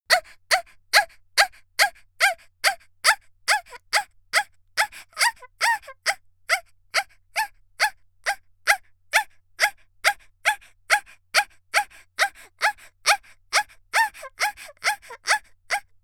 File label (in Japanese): 「あっ」, 元気な少女; （少女42）ピストンに合わせて「あっ」20秒